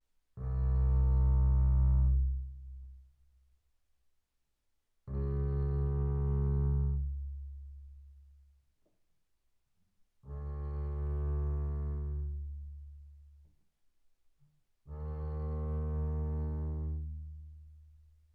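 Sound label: Bass arco sul E mezzo forte C2 B2, double bass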